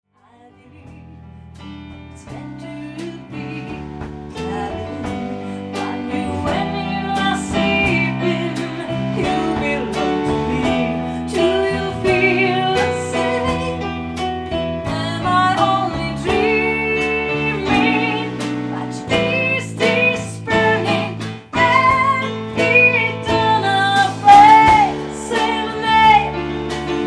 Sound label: pop standard